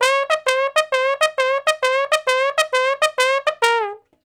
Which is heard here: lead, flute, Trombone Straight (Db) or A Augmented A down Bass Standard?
Trombone Straight (Db)